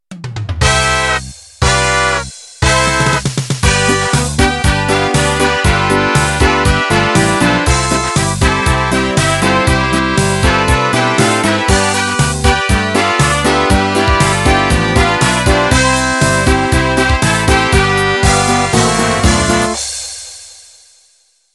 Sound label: Pop Polka Intro